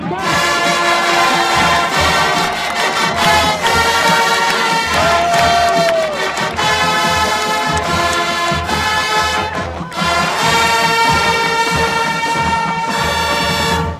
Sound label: Fanfare; SDSU; Pride